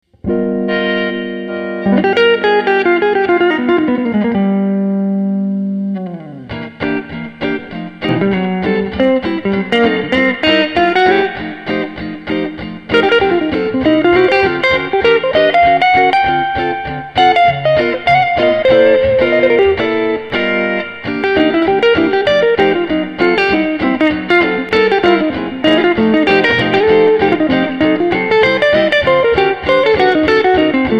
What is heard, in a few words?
Tele Neck Rhythm and Lead